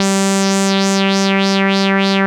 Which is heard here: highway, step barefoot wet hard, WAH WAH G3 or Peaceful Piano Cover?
WAH WAH G3